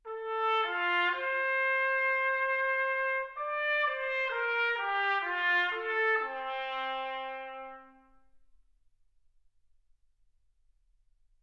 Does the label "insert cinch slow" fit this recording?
No